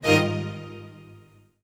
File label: string stab